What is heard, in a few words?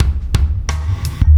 Landing Party Beat, Processed Drumkits; Sonic Foundry (Sony Creative Software); Processed Drumkits Zero Gravity Beats